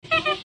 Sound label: hehe cat